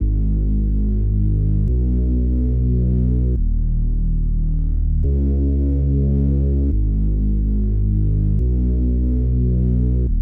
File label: Hyperbass 143 BPM D# Min Reese; Melody Loops; Loop Stems; Cymatics; Hyperbass Pt 1